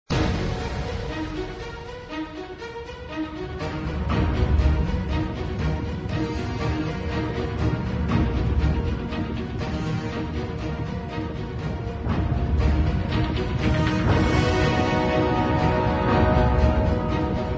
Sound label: game music; marvel; city under attack